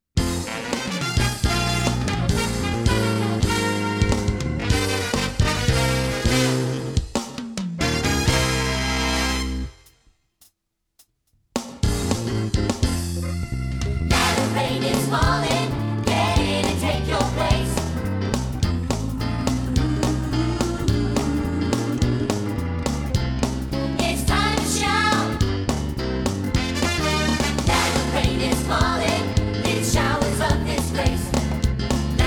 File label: Accompaniment